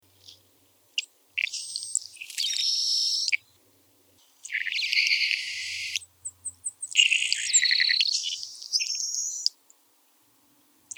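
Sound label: savannah sparrow voice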